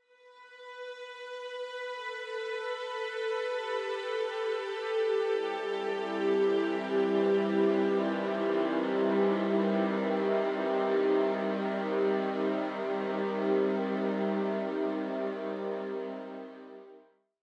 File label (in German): Streicher processed